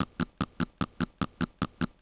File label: snick